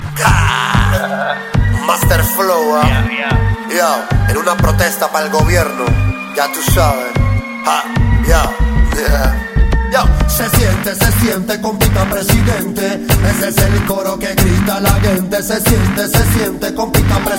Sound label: reggae